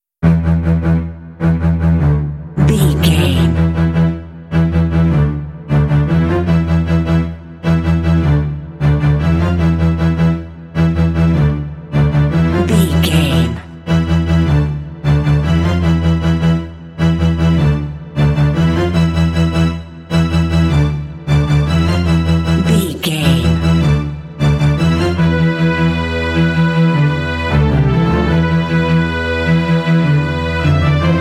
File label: orchestral tension and suspense strings only